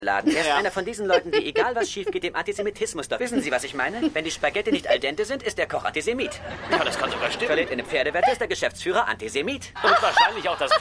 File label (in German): Lachen; Lydia; Seinfeld